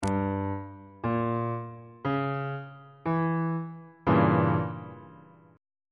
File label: f flat diminished 7th 1st inversion on bass clef